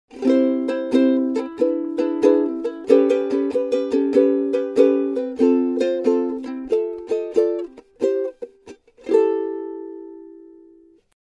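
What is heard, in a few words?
SOPRANO UKULELE; SOPLANO UKULELE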